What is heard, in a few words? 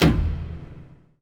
sfx sci fi; Masterbits - Switch FX